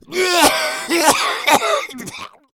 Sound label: smoker idle